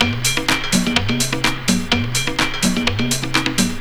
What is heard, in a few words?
TRIBAL, Techno Trance; E-MU Formula 4000 Series Vol. 2